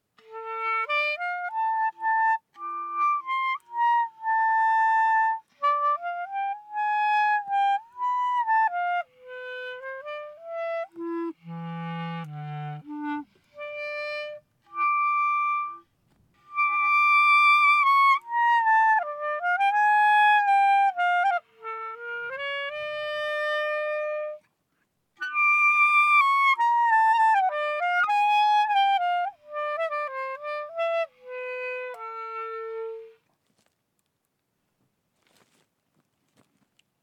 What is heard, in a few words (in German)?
Stand Hoch Ton Spiel Wind